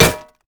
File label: basic attacks; Dr. Mundo; TPA Mundo